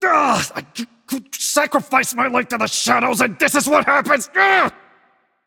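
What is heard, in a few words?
nonverbal grumble; voicepack; smite; merlin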